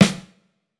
pop snare; japanese pop

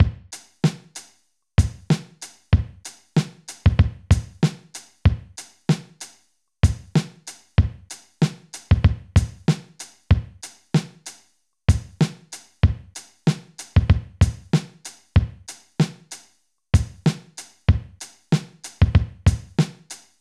lofi hiphop loop 95; Legendary lofi hiphop

